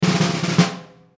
Roulement tambour; Cirque

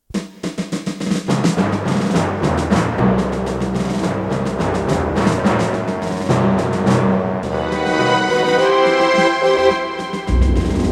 Cinema Jingle; Jingles medley

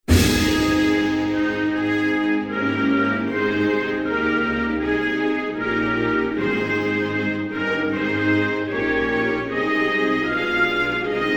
Lithuanie, Hymnes nationaux

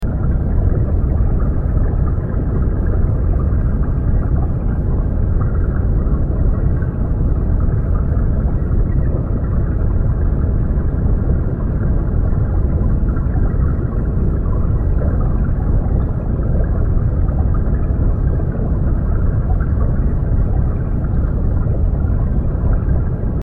Mer abyssee

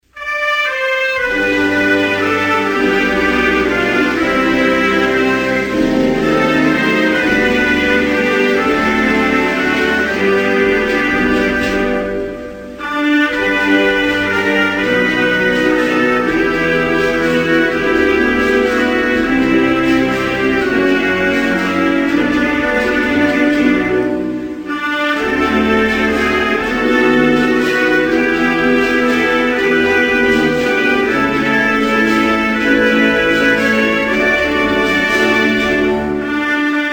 Aruba, Hymnes nationaux

